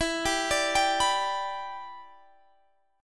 E Minor 7th, Guitar, Standard; arpeggiated

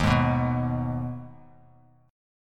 D sharp Suspended 4th Sharp 5th, Bass Standard; strummed; JGuitar

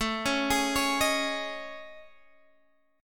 A Major 7th Flat 5th, Guitar, Half Step Down; AM7b5

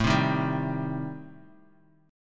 A-Minor 7th Flat 5th, Bass Standard, chord; 17,15,13,12; Am7b5 strummed; JGuitar

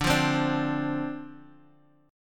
D-Minor 7th D, chord

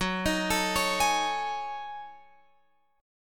F sharp Minor 6th Add 9th, Guitar, Open F; F#m6add9 arpeggiated; x,13,15,16,16,15

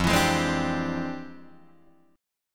F Minor Major 13th, Guitar, DADGAD

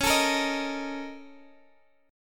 A sharp Minor Major 11th, C sharp

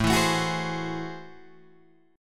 A Minor Major 13th, down, Guitar, DADGAD; AmM13; strummed

